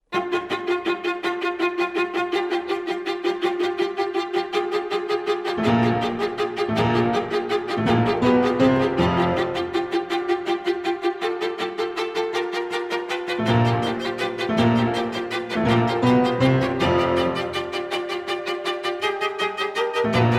Quintet, Allegro agitato

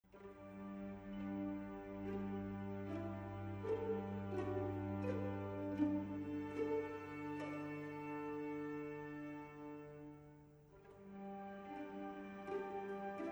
Strings; Werner Pirchner; Sondeckis

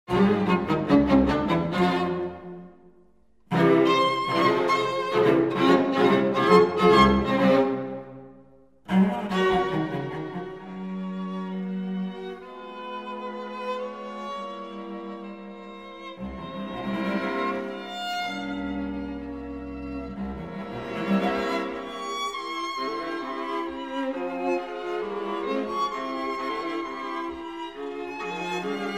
quartet; Beethoven; Serioso

